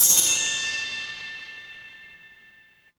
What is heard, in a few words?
instrument chime bar